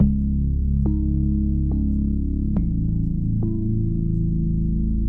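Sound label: moody bell, 94 bpm; SYNTH AND ELECTRONIC LOOPS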